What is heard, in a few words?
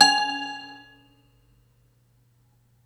soft C#4; Ableton Foundation FreePacks; Foundation Glitch Toys & LoFi